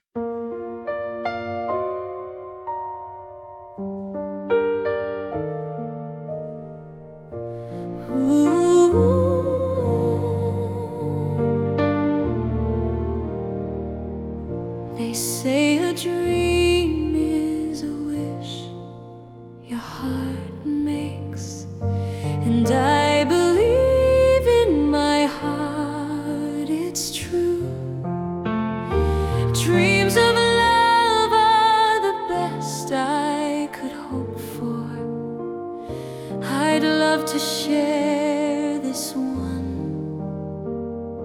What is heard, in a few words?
Female